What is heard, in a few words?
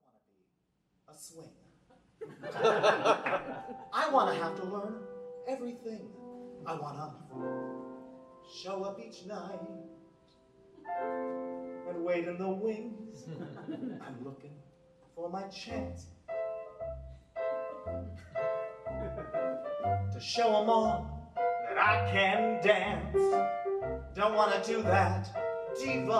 swing